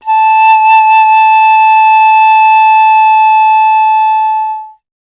FLT PIC, Piccolo; Miroslav Vitous - Solo Instruments VOL-2

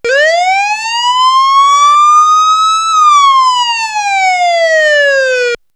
Siren; X-Static Goldmine

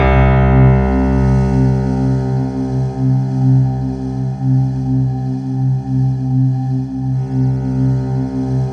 C2, SynthLine; 90 s Sample CDs; NiceBeats; SynthLine VOL-22

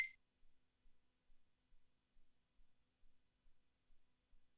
Stick

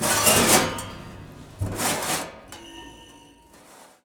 Prepared Piano; Battery 3 Sample Kits

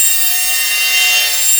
A#4, TURBOBELLS; Northstar - The Wizard VOL-1

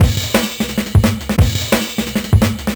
DNB BREAK

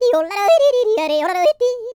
YODEL; ILIO; Vocal Planet

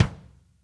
Hi Kick; Kick Snare Layers; Drum Bits; layering

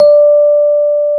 D 4, Electric Pianos; Wizoo Electric Pianos

